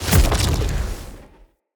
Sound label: Casting Device Impact Damage; Weapon Impacts; Casting Devices; Fenn